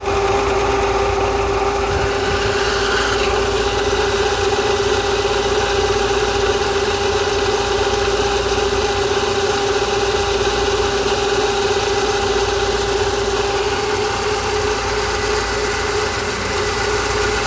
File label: Noisy Slant 6